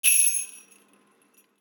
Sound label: Jingle Bells, percussions; drums